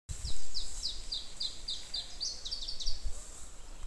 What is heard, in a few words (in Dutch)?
Iberische Tjiftjaf, zangvogels